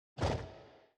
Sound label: walking Wild Movement Random Sound; godsoffortune